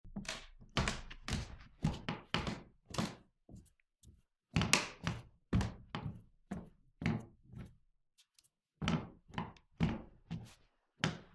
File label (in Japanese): 古びたフローリングの歩く音; walk